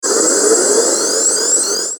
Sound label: static power; Static Power Up; Production Element Imaging Element Accent Transition; Blastwave; Static Sound Effects